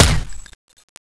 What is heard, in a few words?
ump45; ghost ws; weapons